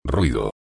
ruido

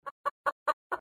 gallina

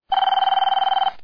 telefono

